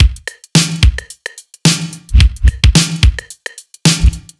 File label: drum loop shuffle, 109; mixready shuffle boy full